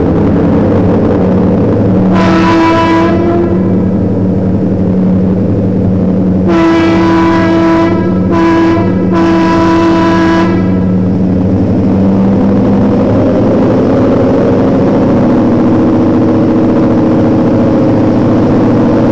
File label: single tone pass train horn